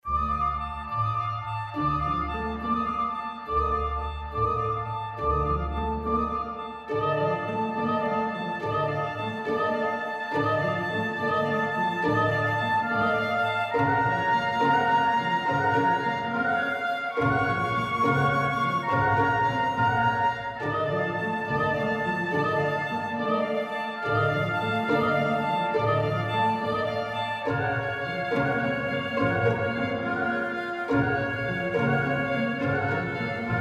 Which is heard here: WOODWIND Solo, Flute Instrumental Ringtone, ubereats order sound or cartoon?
WOODWIND Solo